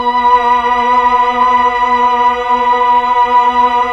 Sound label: PAD, Slow Str+Vox; Roland LCDP09 Keys of the 60s and 70s 1